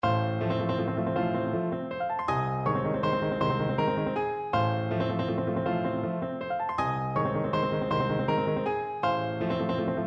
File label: amor ti vieta flute